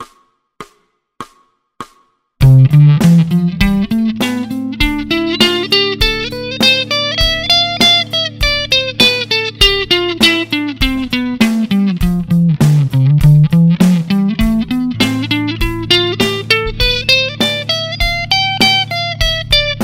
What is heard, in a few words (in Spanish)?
Escala Mayor 3 figuras 100 bpm